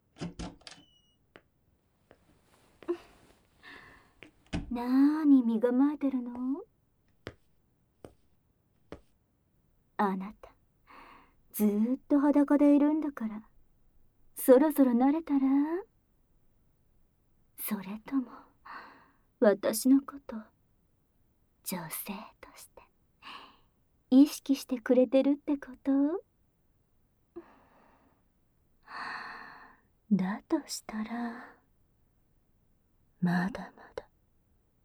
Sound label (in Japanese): 耳舐め&乳首責め, ASMR; ドスケベ乳首遊び★★『【かりかり・こりこり地獄】耳舐め&乳首責めでオナニー誘惑』